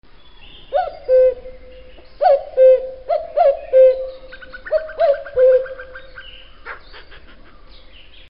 kakukk

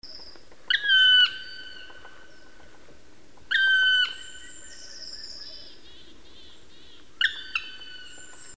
fekete harkaly, madarhang

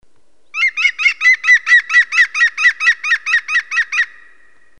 zöld küllő

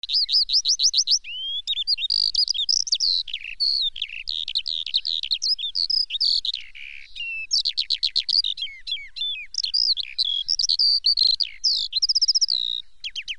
mezei pacsirta, madárhang

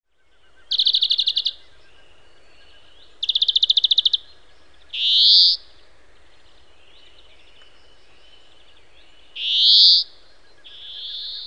zöldike

